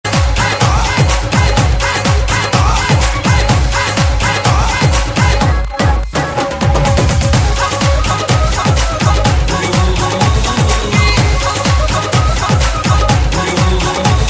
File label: hip hop